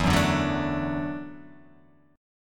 E 7th Suspended 2nd Suspended 4th, down

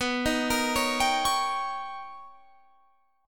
B Augmented Major 9th B Guitar Standard, chord; B+M9; arpeggiated; 19,18,20,18,20,21; JGuitar

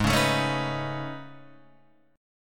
A Major Sharp 11th, G sharp

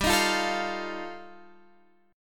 G 7th Suspended 2nd Sharp 5th G; G7sus2#5 strummed

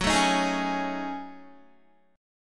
Gb Minor 6th Add 9th Gb down Guitar; Gbm6add9; strummed

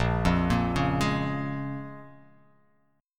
Bb-Minor 7th, Bbm7 chord; Bbm7 arpeggiated

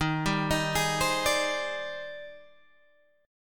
D sharp Major 13th, down, Guitar, Standard; D#M13 arpeggiated

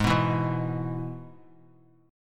Ab Minor Sharp 5th Ab down; Abm#5 strummed; JGuitar

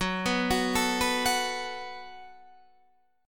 F sharp Minor Double Flat 5th, Guitar, DADGAD; chordshape; down

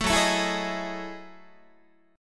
F sharp Minor 13th, Guitar; strummed; JGuitar

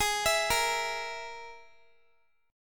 G sharp Suspended 2nd Sharp 5th, Guitar; arpeggiated

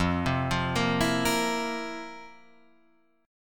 F Major 7th Suspended 4th, Guitar, Drop D; down; chord shape

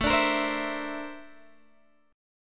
A sharp Major Sharp 11th, chord

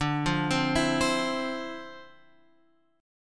D Minor 9th; Dm9 Chord {10 8 10 9 X 8} arpeggiated

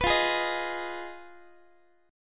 F Minor 7th Flat 5th, Guitar; Fm7b5; strummed; x,x,14,15,12,14

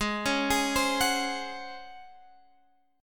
Db Major 7th Suspended 4th Ab

